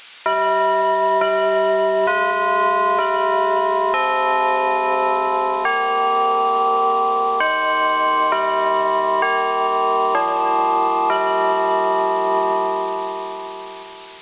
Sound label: bells, house sounds; ambience